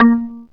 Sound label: A#, Synclav FM PERCUSSION; Big Fish Audio